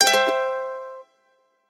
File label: multiline win; Hot Party Deluxe; Wazdan